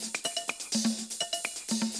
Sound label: 125, Classic Drum Loops